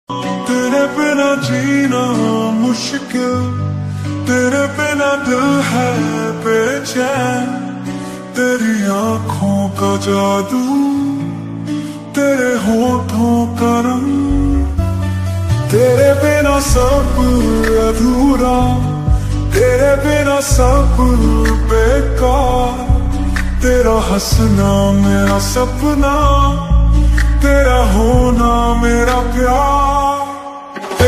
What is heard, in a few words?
hindi ringtone, ringtone song, sad ringtone